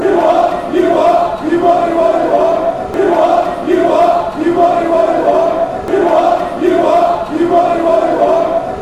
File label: you what fanchants